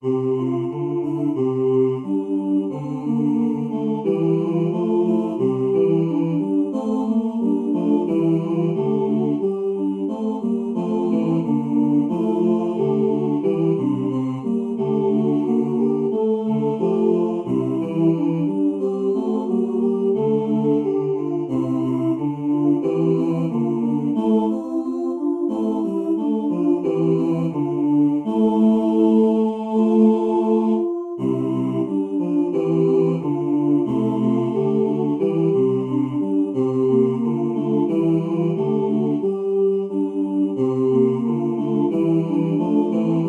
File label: Fuga up 3rd; Climen; Fuga Trium Temporum